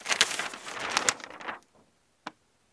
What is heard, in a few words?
Newspaper Page Shuf; paper mvmt